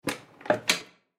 Ship Closet Door Open; Transportation Watercraft

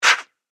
Pneumatic Release, Scifi; Science, Fiction; Spacecrafts; BlastWave

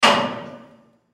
Ship Impact Metal Reverb; Transportation Watercraft

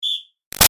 Whistle Sports Hard Blow Short Single; Miscellaneous Sports

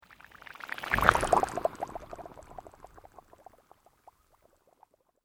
Sweep Organic Slow

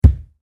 Impact Hit Solid; Punch And Hit Sound Effects; Punches and Hits (Sound Effects:Combat); SFX Bible 6

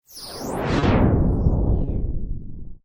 Whoosh Phase Heavy; Whoosh, Pass, By, Heavy, Reverb, Production, Element, Imaging, Accent, Transition; BlastWave

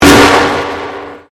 Convolution Pulse

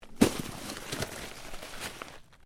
Body Movement Snow Drop; Body movement foley to simulate falls and deaths; Drops; Objects

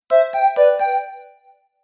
Magical Message Notification; Musical Sound Effects

